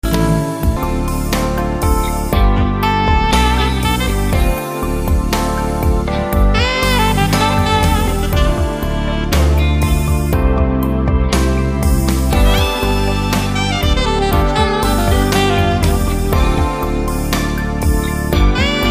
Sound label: Sax Ballad